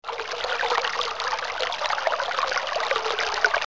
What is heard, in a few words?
Water; Myst